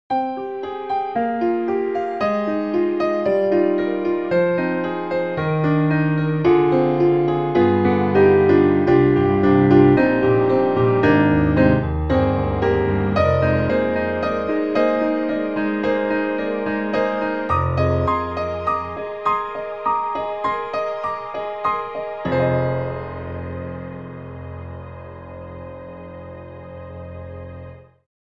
piano strings; EastWest; Quantum Leap Goliath

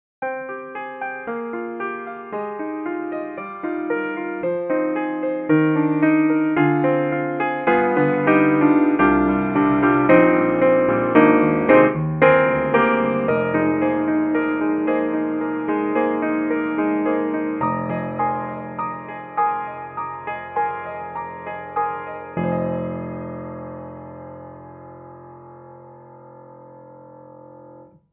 Parlour; Soniccouture; The Hammersmith Professional Edition

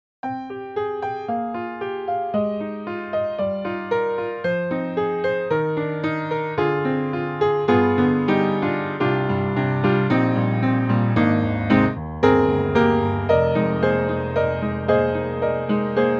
Modern Upright Modern Mix; XLN Audio; Addictive Keys

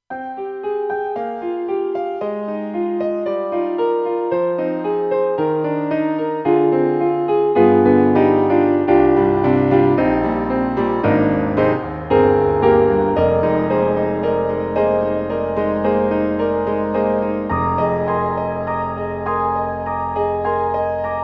Dark Piano; SUZUKI; BH-1000

